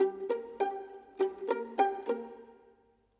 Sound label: Violin Pizz, Orchestral; Sonic Foundry (Sony Creative Software); Orchestral VOL-2; Modern 32